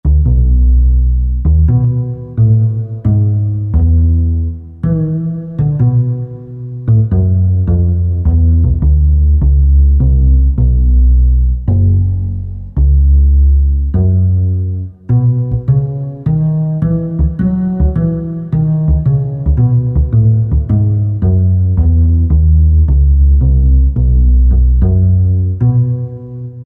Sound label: UPRIGHT BASS; Sonic Reality American Heartland